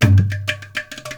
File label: India, Heart of Asia